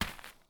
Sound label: Girl footsteps; Robobeat